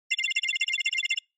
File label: Cyber